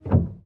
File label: piano release